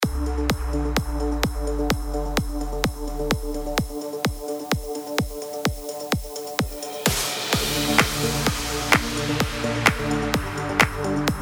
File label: bass hards; zub